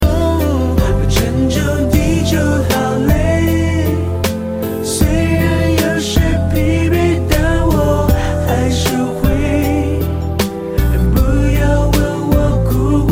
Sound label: awsome whistle tone